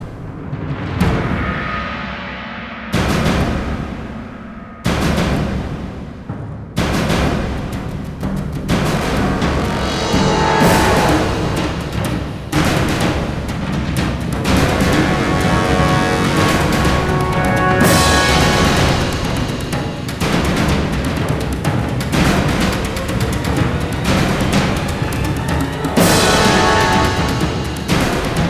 Battle F#m 125bpm; Disney Infinity 2.0 Marvel Super Heroes; Arachna

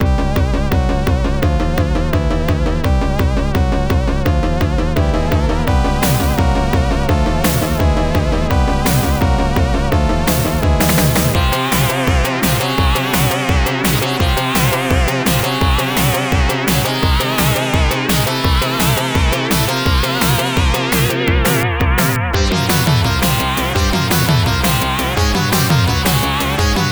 Evil Beat (Stage 3 Boss), genesis; Elemental Master

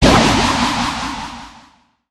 zap

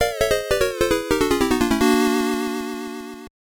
Failure; Lemmings; Game Gear

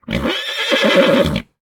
Animal Horse Neigh Dry Mono; Smashy Road Wanted 2 android ios switch gamerip 2020

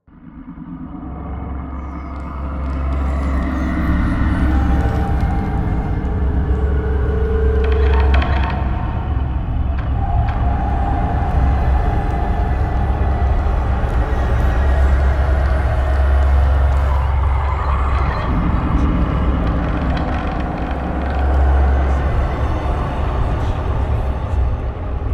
Combat Suite Main Ambient; Super Gore Nest

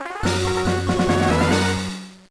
Fanfare Success!; Pokemon LeafGreen; gba

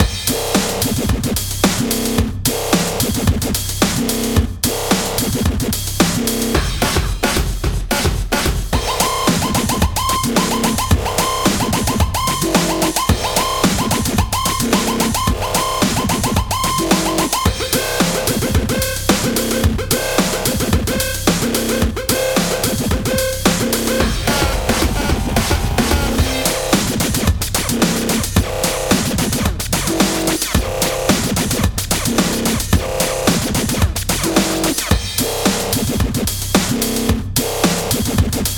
Rave; Lego Marvel's Avengers; PlayStation Vita; Level 11

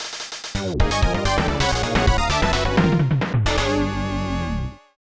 Unused Game Over; X-Kalibur 2097; SNES

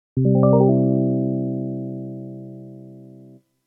Multiplayer Game Over; New Super Mario Bros. U Deluxe

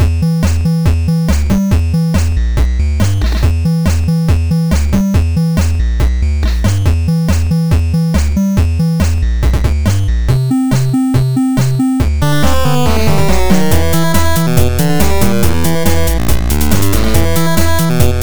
Really Big Chiptune; Really Big Sky

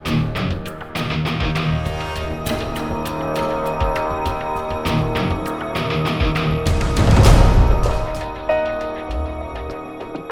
Intro Signal

